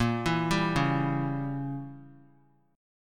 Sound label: Bb Diminished 7th Bb, Chord; arpeggiated; JGuitar